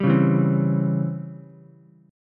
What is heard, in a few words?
Db Minor Major 7th Flat 5th Db down Ukulele; DbmM7b5 strummed; DbmM7b5 chord; JGuitar